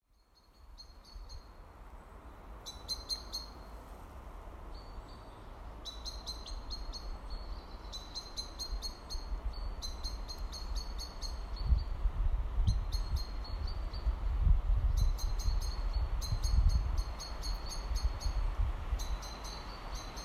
Karakorpi soundscape, Finland lockdown recording

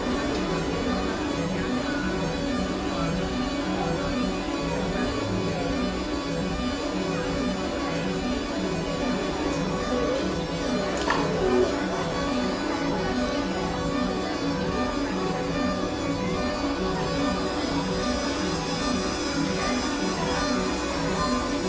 arpeggiation whilst ring modulating

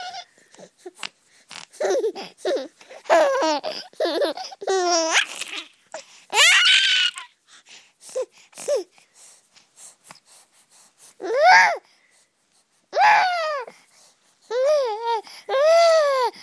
giggles

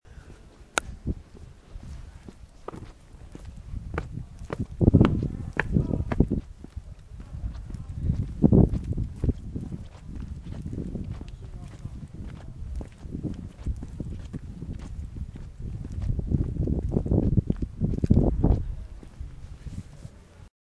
crunching mints at midnight

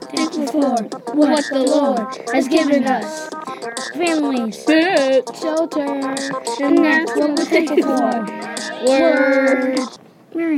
rap

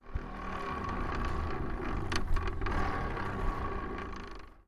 rattle

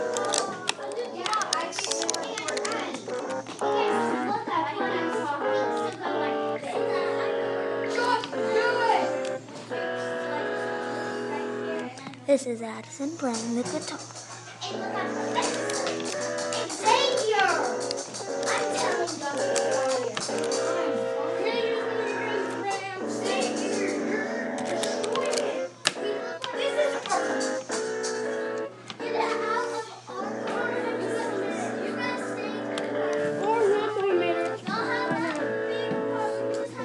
playing the guitar

